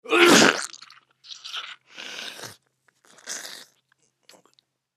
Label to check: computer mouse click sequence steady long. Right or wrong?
Wrong